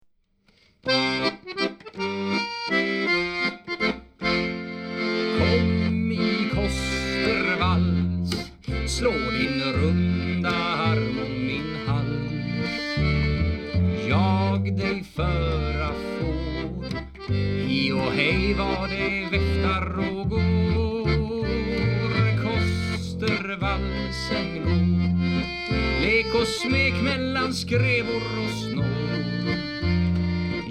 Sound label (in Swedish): matrosorkester; Gotheborgs matrosorkester karusell; Kostervalsen